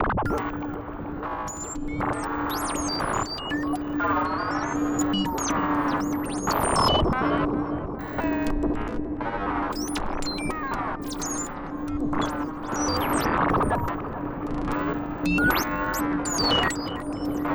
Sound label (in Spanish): Loops mutantes